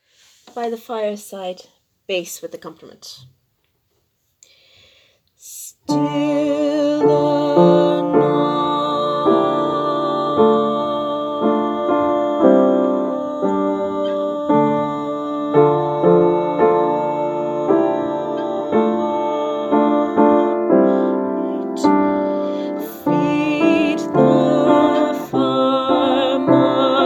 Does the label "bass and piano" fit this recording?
Yes